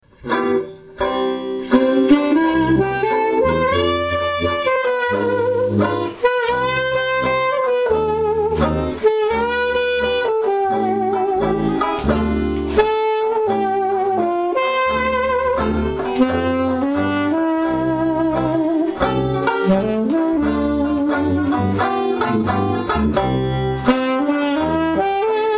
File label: Jazz Band